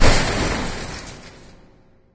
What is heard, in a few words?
metal debris; ambient; Left 4 Dead 2; Source Engine; random amb sfx